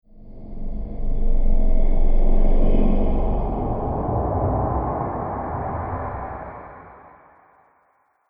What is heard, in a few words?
Sf Film Star Wind Gust, Science Fiction Sound Effects